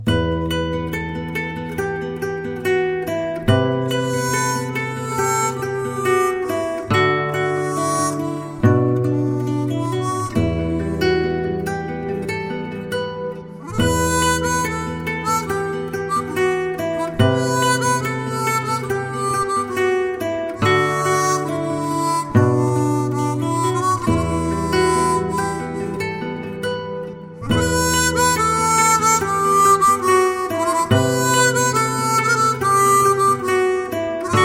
Harmonica

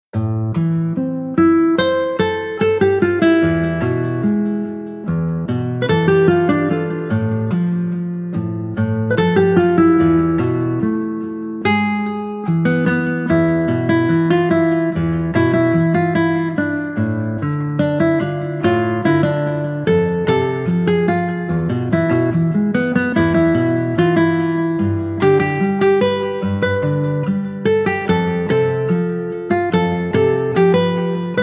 Guitar

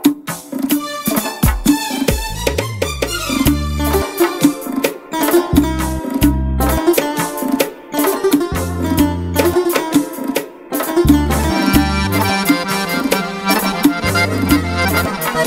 Accordion

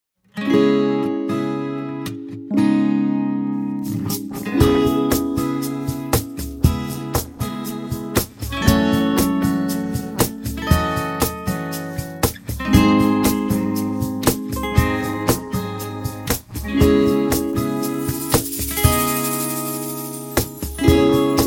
Guitar Version

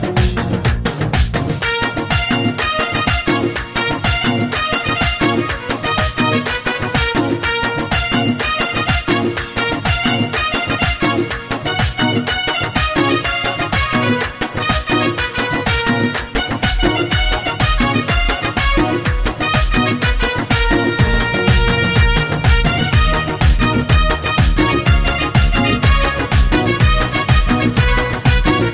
Keyboard

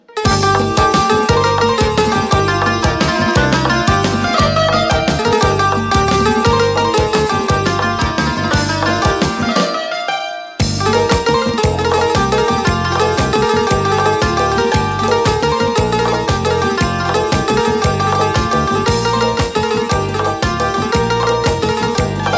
Keyboard, play back

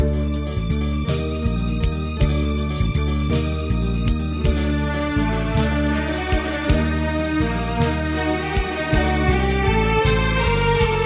Flute

